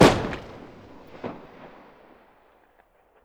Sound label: ak74 rev; Weapons